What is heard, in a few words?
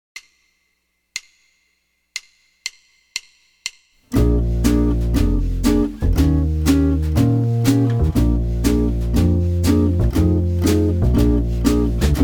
Jazz Blues Bb Major Root 5, jazz guitar